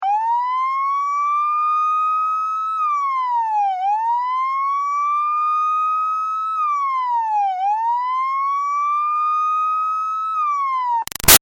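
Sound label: Siren Police Wailer Steady close up; Emergency Vehicles; Big Room Sound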